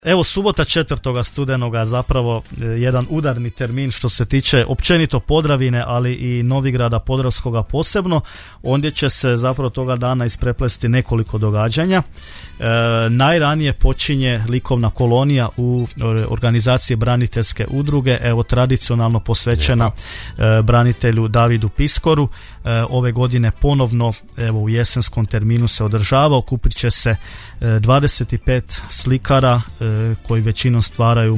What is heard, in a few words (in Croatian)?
orgulje; Novigradske jesenske orguljaške večeri